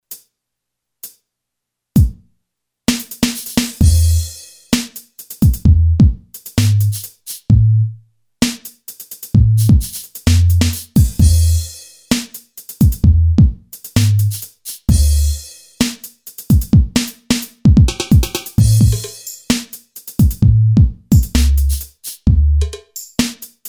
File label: NEW R&B, Boss DR-3